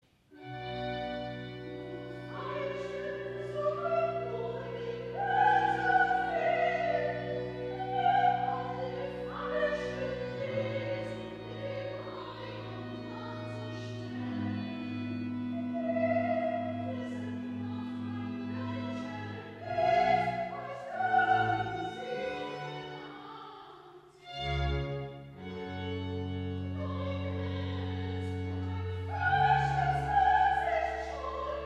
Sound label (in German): Recitatief; Du Falscher